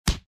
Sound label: punch; The Witcher Hunt